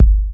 raw kick drum single hit g key, Royality free kickdrum tuned to the G note